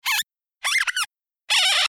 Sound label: comical cute